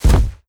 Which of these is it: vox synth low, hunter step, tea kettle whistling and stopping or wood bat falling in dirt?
hunter step